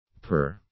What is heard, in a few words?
purr